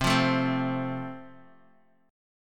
A Minor Sharp 5th

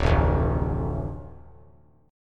D Minor D, chord; Dm strummed; 2,0,0,0; JGuitar

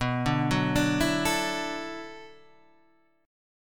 B Minor 11th B down

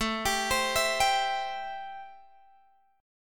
A-Minor 7th A Guitar Open G

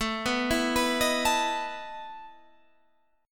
E Major 7th Suspended 4th, A

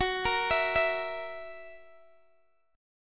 Gb 7th Gb down Guitar DADGAD, chord

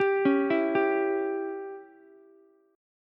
C sharp Diminished C sharp, Ukulele; arpeggiated; chord; JGuitar

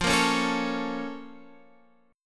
Bb Minor Major 7th

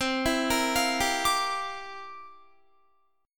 C 11th C down Guitar; arpeggiated

